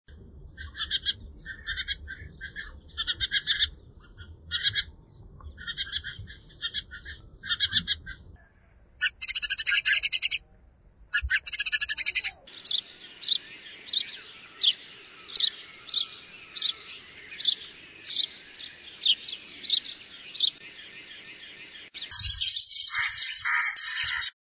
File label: house sparrow